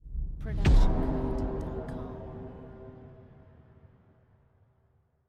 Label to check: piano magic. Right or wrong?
Wrong